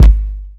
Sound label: small reverb bass drum sound g sharp key